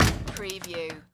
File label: Cellar Door Open